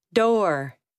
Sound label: door